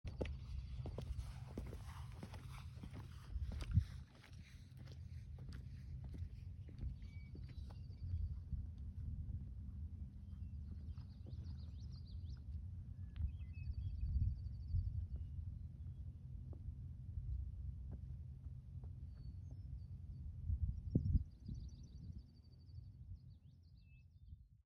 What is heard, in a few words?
Hiking and birds; the coast of maine